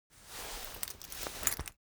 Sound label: cloth key ring mid size pocket fetch; foley; smartsoundfx